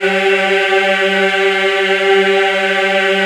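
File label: G3 men women eh, Choir; USB Soundscan vol.28; AKAI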